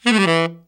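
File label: RIFF DOWN, TENOR SAX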